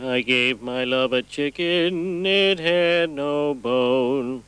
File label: chicken